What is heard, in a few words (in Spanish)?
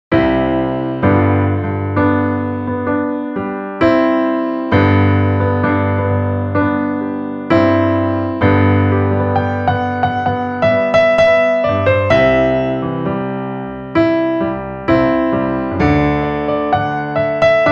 Version Piano (en vivo)